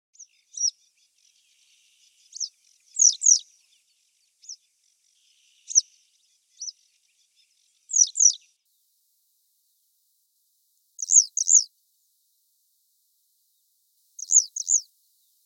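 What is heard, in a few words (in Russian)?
penie pliski ili zheltoj trjasoguzki, yellow wagtail song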